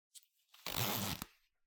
ジーパンのジップを上げる音

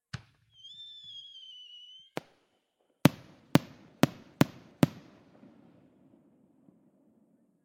花火の開始の合図の音; firework

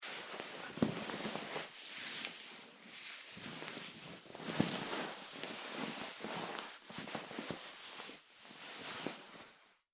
布団の布擦れ音

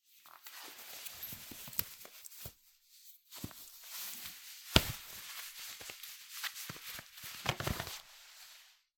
靴下を脱ぐ音

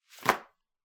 ゴム手袋を置く音

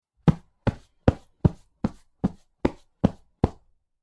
布をポンポン叩く音

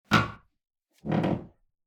フローリングがきしむ音

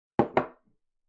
グラスを置く音

